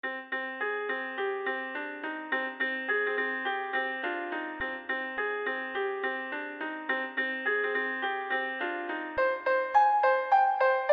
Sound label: 105 BPM C Minor